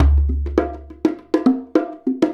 Bonga; Essential Percussion

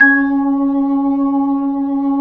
B-3, Jazz

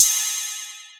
Ride, Club Techno Percussion Cymbal; Zero-G

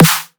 dubstep snare; M8

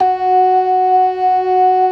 LOW MAN, ORGAN; InVision Interactive; Keith Emerson; ORGAN SPLIT

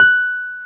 PNO Wurly, F#; Roland LCDP10 Keys of the 60s and 70s 2; Wurly 200B

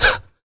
FEM PERC, Vocal Jazz FX

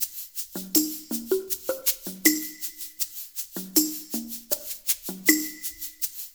LOOP, Percussion

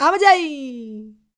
Flmnco F Vox; Roland BK-5

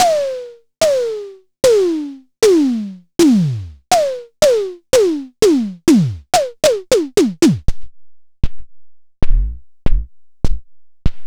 Tama TS-206

